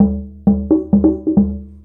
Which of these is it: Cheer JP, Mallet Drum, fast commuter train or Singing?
Mallet Drum